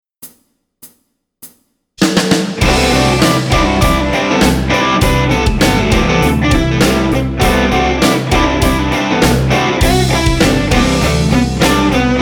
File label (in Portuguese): Penta Bm7, guitarra; Dicas de Guitarra